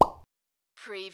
CARTOON POP

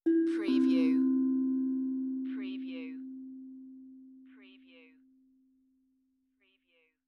Tongue Drum Meditative Tone; beanstalk audio

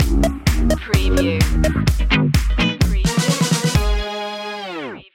disco loopy stinger

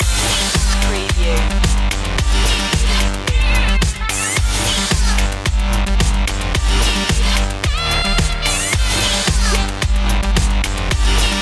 french punk loop

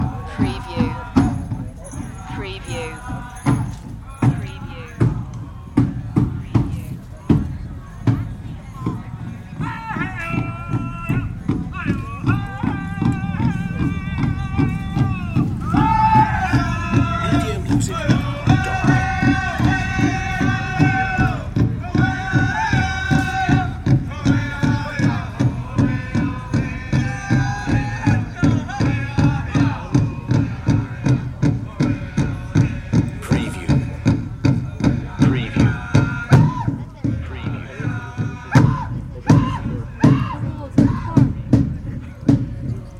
Pow Wow Drum Dance

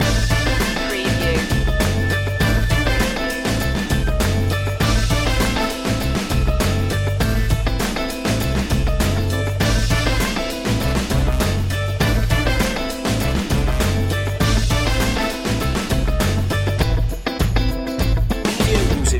funk punch loop

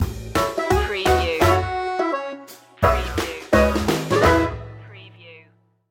party barn banjo stinger

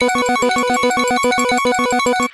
Casino Slot Machine Winning Count

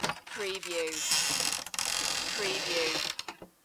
Door Open With Creak

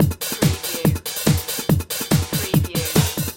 Techno-Trance Drum Loop 142 BPM - Snare Pull

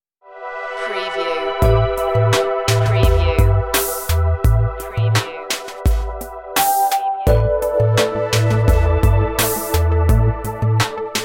soft plug in stinger

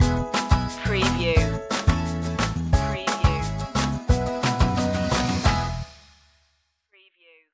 candy apple pop stinger

